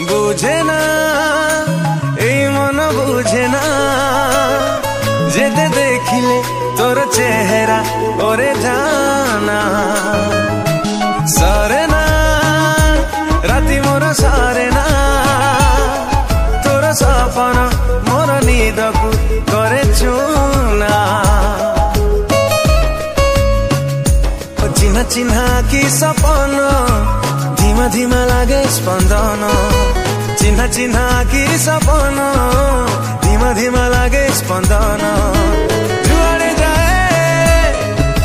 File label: Odia Song Ringtone